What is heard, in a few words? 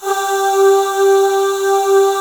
G3 MALE AH; Northstar - New Gold VOL-2